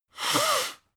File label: nose blow; soundpool